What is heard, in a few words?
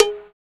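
PER PROCU, Procussion, percussion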